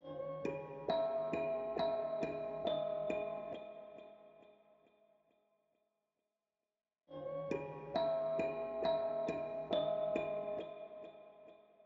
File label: 136 BPM C# Min Bells; Melodies; Loop Stems; Cymatics; Red Sea; PHARAOH Beta Pack; Pharaoh Premium Drum Samples (BETA)